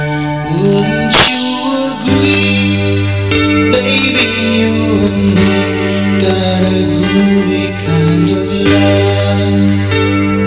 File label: groovy